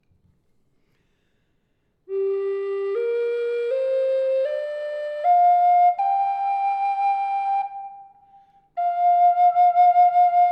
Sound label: reverb, Flute